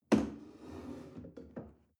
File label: chair wood place slide soft